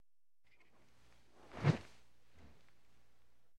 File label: Baseball Bat Swing W; baseball fx & games